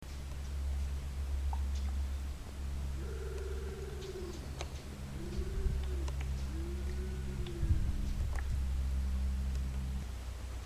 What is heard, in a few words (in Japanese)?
karasu bato